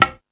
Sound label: Latin Layers, Drum Machines E-MU Pro-Cussion